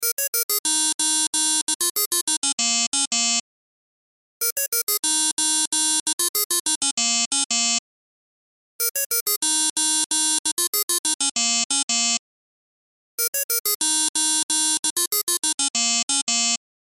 Cell Ringtone

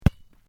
Sport Soccer Bounce Gravel, Soccer ball sounds

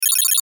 Satellite Lock On; Multimedia; Blastwave

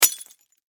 Impact Bullet Glass Hit

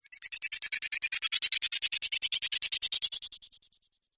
Magic Stardust Whoosh; Whoosh Sound Effect; Swish, Zoom, Swipe, Whoosh, Swoop

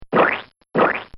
Cartoon Sound Effects Squirts

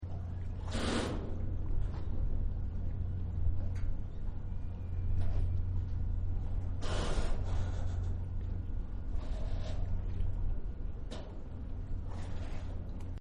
Ship Metal Gangway Amb Loop; Boats; Sound Effects:Vehicles

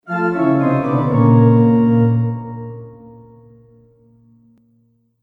Haunted Organ Failure Sound; Musical

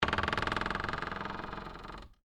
Door Creak Wood, Foley, Door, Creak, Wood; Creaky Doors; BlastWave

